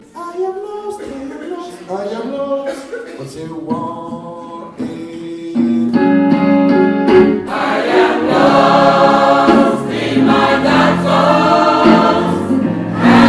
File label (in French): les 3 voix; sop alten